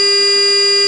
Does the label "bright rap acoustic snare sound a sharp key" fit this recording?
No